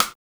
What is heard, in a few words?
TRUE STICK, SIDESTIKS